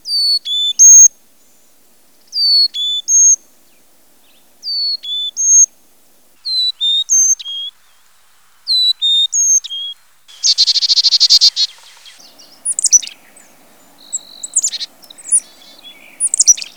chickadee, Bird Calls; Ornithology

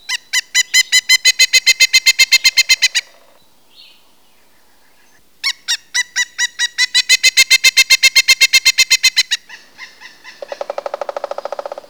pileated woodpecker, Bird Calls; Ornithology

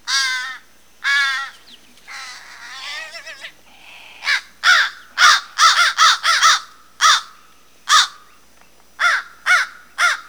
crow, Bird Calls; Ornithology

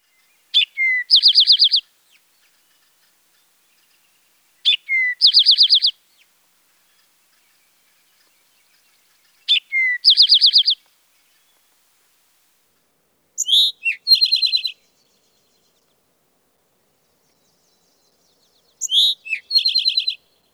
eastern towhee, Bird Calls; Ornithology

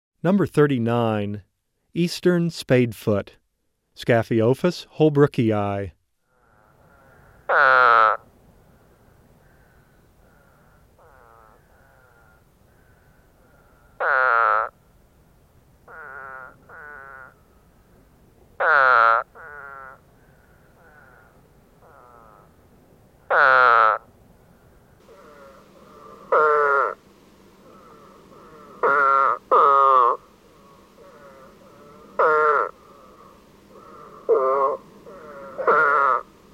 Eastern Spadefoot, frog calls; Herpetology; Herpetology Intro

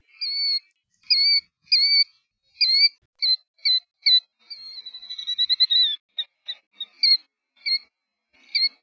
killdeer, Bird Calls; Ornithology

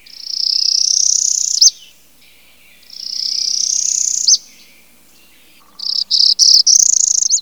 parula, Bird Calls; Ornithology

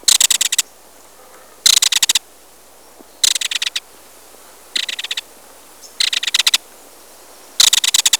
kingfisher, Bird Calls; Ornithology

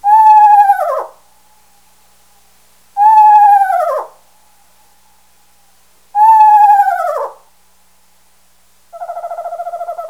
screech owl, Bird Calls; Ornithology

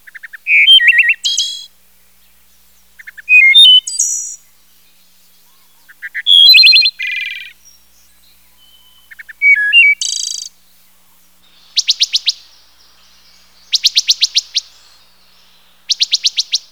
wood thrush, Bird Calls; Ornithology

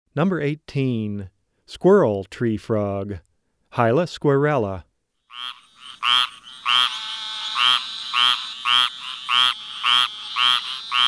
Treefrog Squirrel, Frog Calls; Herpetology; Herpetology Intro

